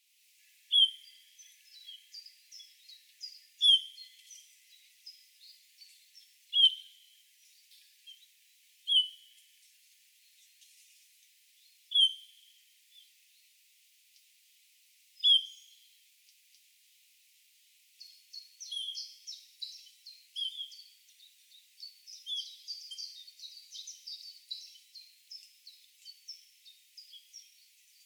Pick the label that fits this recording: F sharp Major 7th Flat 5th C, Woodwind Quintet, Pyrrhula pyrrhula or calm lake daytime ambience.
Pyrrhula pyrrhula